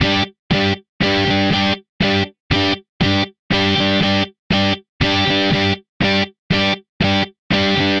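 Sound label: Guitar Rock G minor 120bpm; Guitar and Bass; Ableton; Factory Packs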